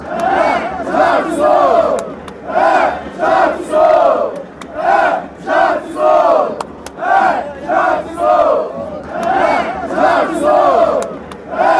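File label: sao paulo chant, football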